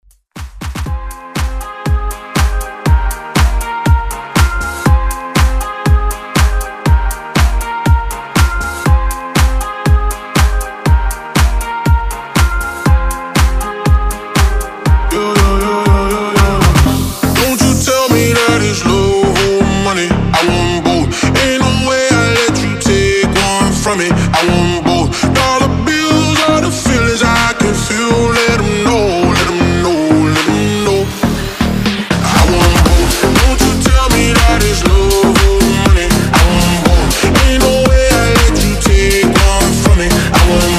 House Redrum

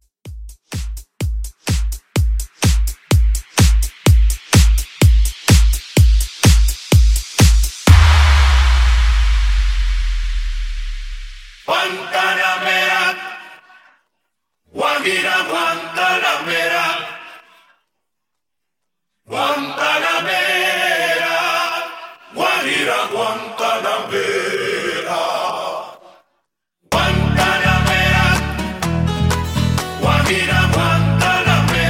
Latin Dance

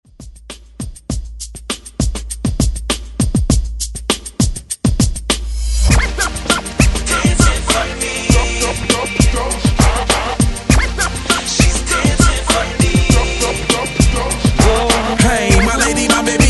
Scratch 100 Bpm; DJDOLLS; Hottest Scratch ReDrum

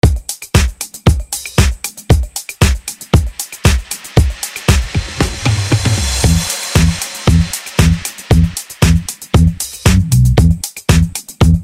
Funk Reconstruction

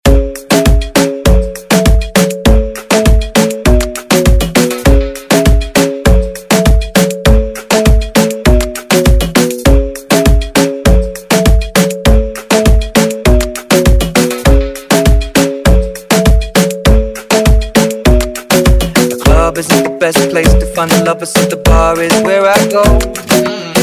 Moombahton Redrum Intro

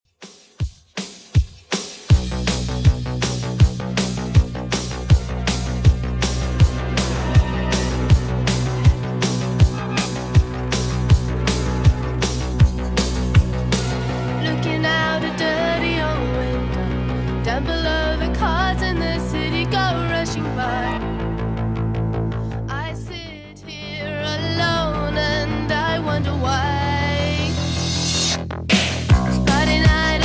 80s Redrum 160bpm CLEAN

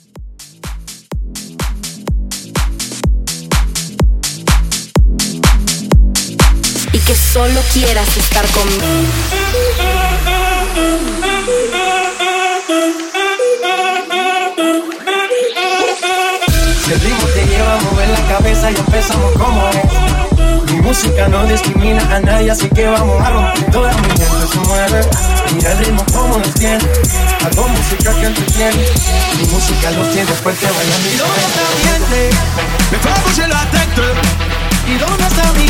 Big Horn 125

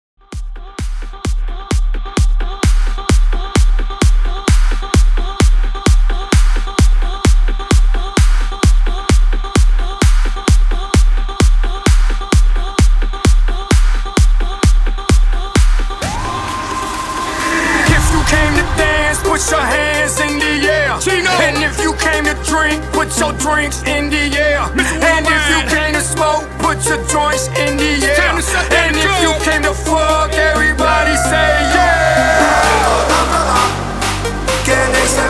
MELODY MASHUP 130 BPM

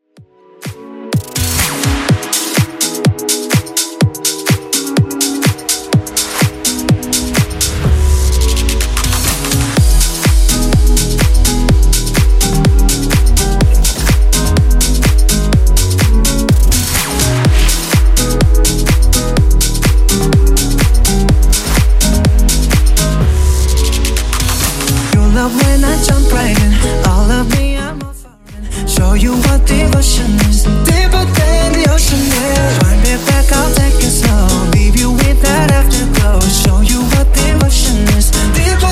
House, 125bpm

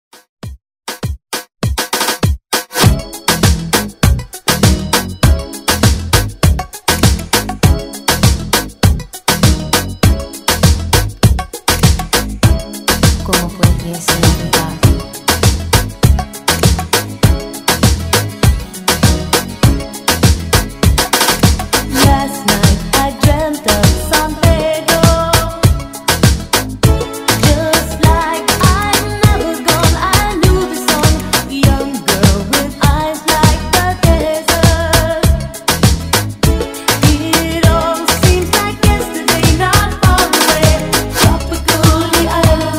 Moombahton Redrum

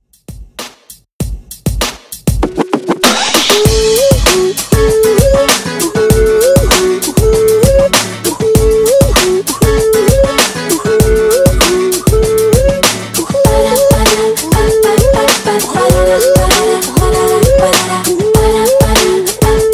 RB 98 BPM

